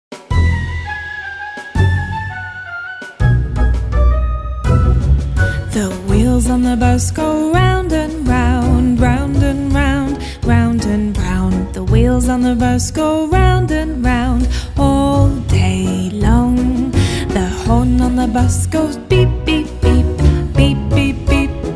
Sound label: english songs for kids